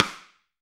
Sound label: RIM MIX, AMBIENCE RIM